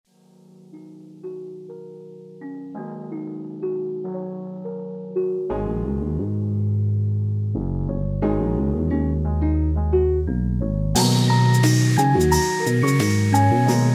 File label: 70HZ Grooves